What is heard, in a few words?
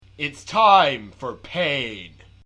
pain